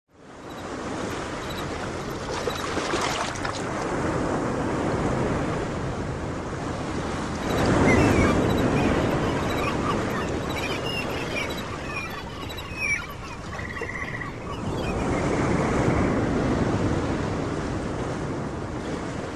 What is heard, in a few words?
Ocean Sounds